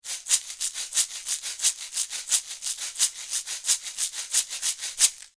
CHOCALHOS DE POTE DE COMIDA PARA PEIXE

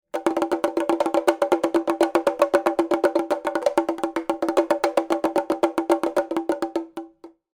CABULETÊ

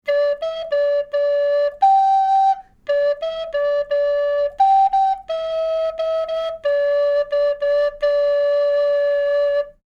FLAUTA DOCE CONTRALTO

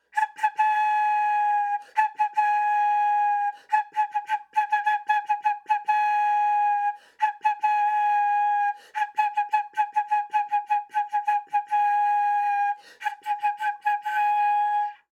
PÍFANO

